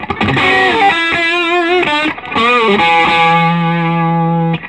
SOLO E, Vintage Blues Guitar

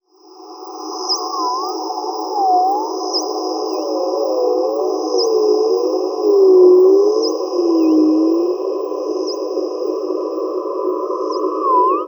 Woodnotes, Ambient; Zero-G Seismic Frequencies; 90 s Sample CDs

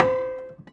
Prepared Piano; Battery 3 Sample Kits

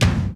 tom, drum; Masterbits - Total Zone

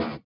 SWUSH; Spectrasonics; Metamorphosis

